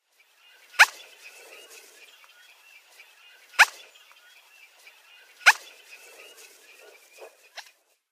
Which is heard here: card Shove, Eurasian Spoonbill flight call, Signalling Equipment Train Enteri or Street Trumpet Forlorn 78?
Eurasian Spoonbill flight call